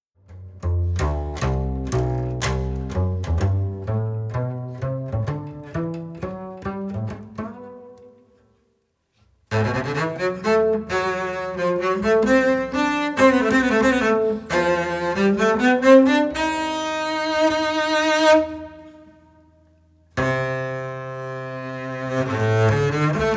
Kontrabas

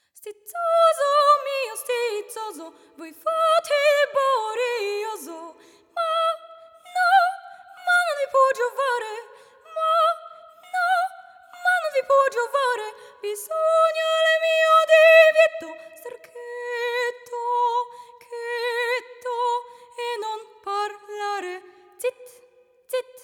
Wydział wokalny; szkoła II st

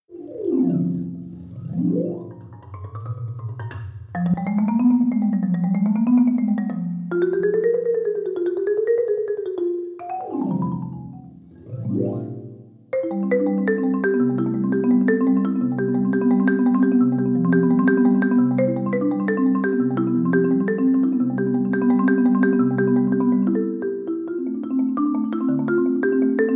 Perkusja; szkoła II stopnia

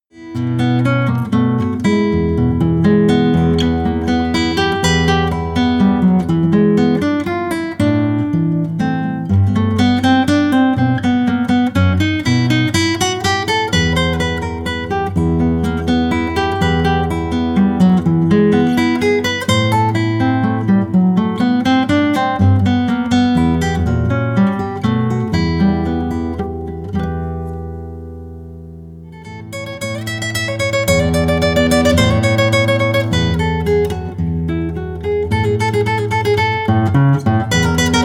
Gitara

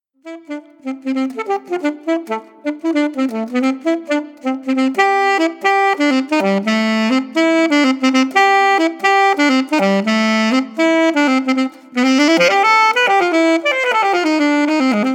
Saksofon

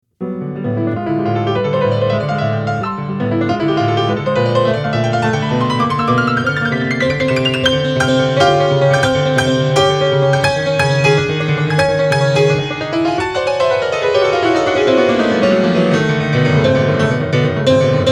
Fortrepian

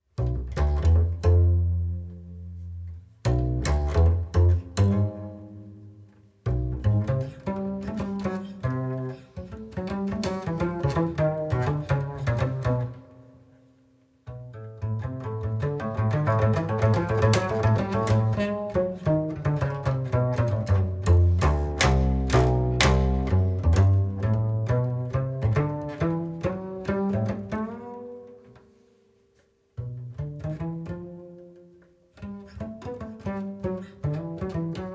Kontrabas

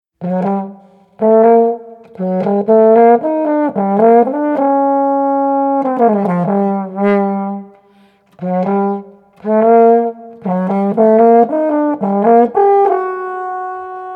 Waltornia

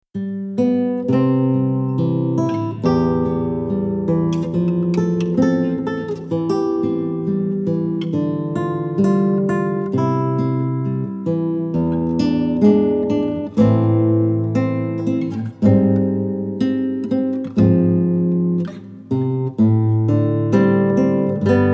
Gitara

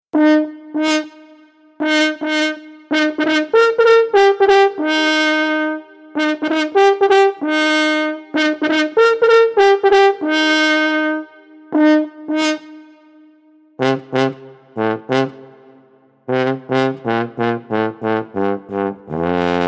Waltornia

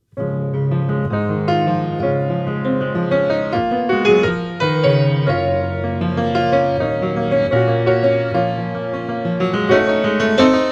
Fortepian

